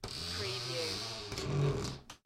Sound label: Shower Door Creak; beanstalk audio